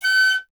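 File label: flute piccolo F#; Miroslav Vitous - Solo Instruments VOL-2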